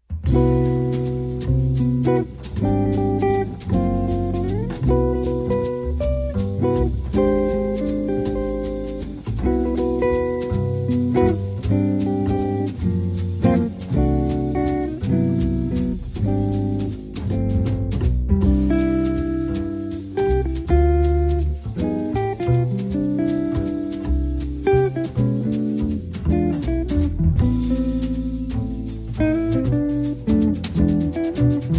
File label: Village Waltz